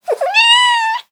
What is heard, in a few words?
Kitten Meow; SES Animals SFX